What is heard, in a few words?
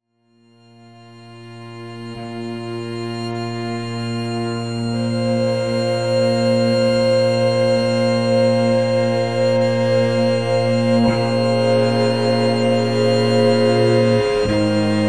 strings excerpt